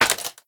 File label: clap; smite; arthur skin9